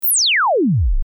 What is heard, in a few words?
Test Tone Oscillator Log Sweep Sine Wave Descend 20Khz-20Hz -12Db; Blastwave